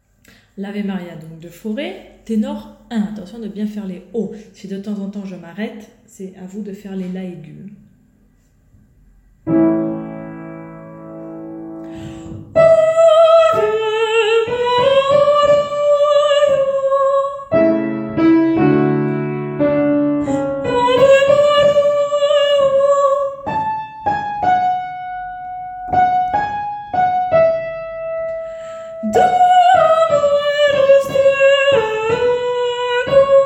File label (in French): partie tenor; Ave maria